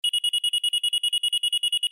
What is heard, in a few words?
Sci Fi Alarm Device Warning Beeps; Control Panel